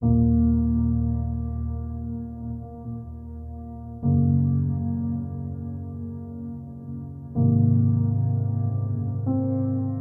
Sadness Reflection

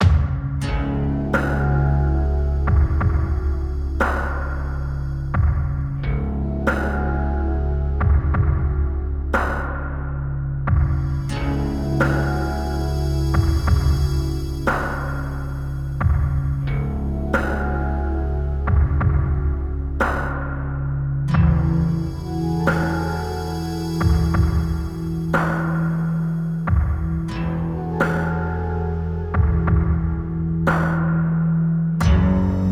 Ruin Loop

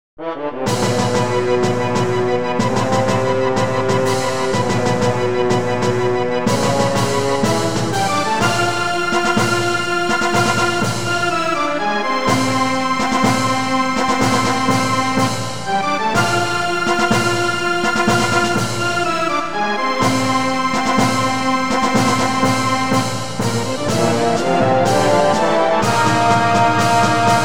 Unused Game Tune; Langrisser Milennium

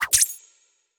Deck Craft

